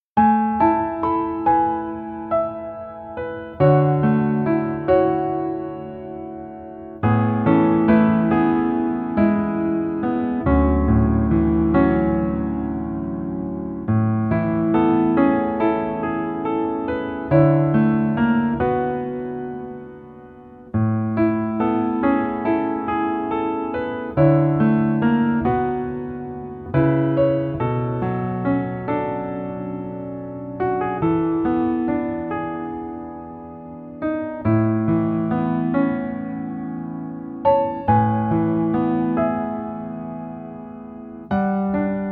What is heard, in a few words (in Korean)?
Piano ver 남자키